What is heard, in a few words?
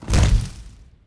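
tree hit large; objects; Star Wars Obi-Wan; XBOX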